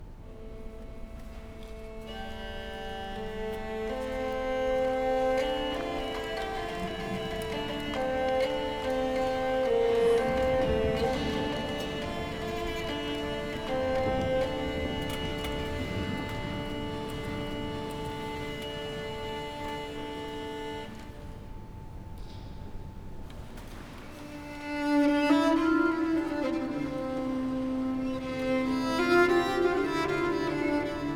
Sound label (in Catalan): Improvisació viella